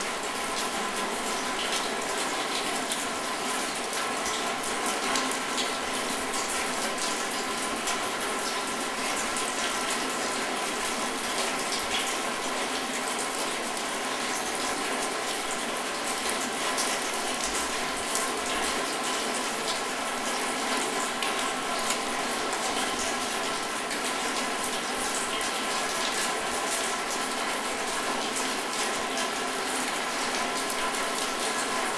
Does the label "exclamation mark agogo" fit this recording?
No